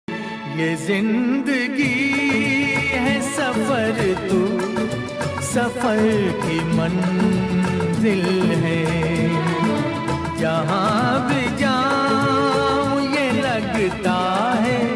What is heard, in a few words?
Old Hindi Ringtone